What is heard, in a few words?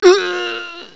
Ughhh; Riviera; Ledah